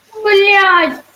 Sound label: blaaaaa